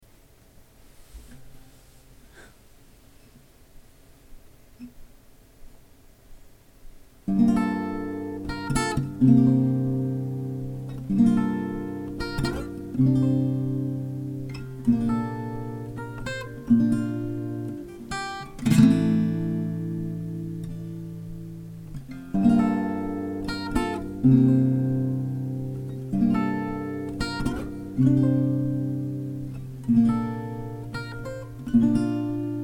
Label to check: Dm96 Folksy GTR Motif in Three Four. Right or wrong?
Right